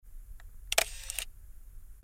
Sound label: standard shutter